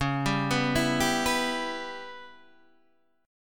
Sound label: C sharp Minor 7th Flat 5th C sharp Guitar DADGAD